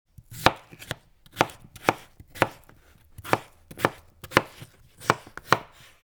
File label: Wooden cutting board chopping vegetables